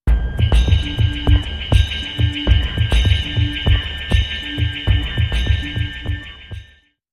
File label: Beat Sting